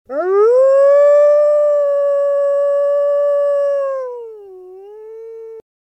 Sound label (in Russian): obychnyj voj vola